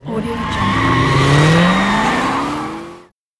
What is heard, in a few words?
race car driving away